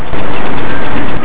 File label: Piano